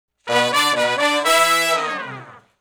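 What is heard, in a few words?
Shuffle Blues Riff (E) unison falloff, Horncraft for R&B Sections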